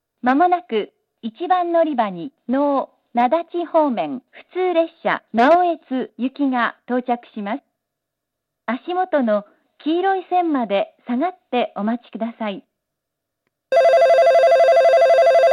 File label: local naoetsu, itoigawa station; echigo-tokimeki-railway